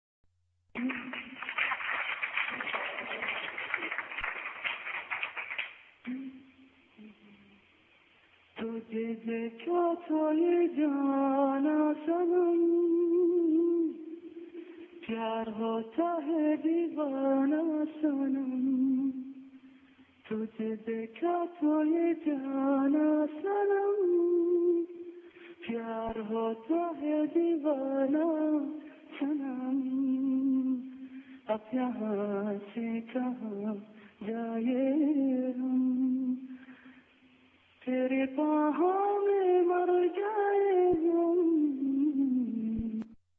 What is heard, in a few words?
indian song